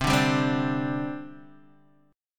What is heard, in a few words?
C Suspended 2nd C Guitar DADGAD; down; chord shape